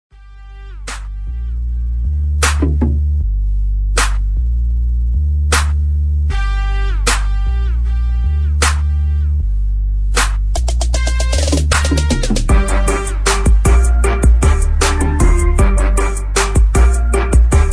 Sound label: instrumental